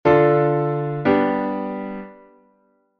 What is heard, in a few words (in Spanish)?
cpicarda en sol m; Cadencia Picarda